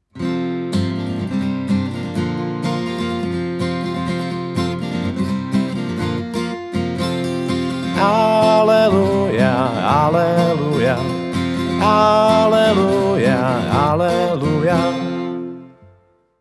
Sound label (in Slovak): Aleluja F dur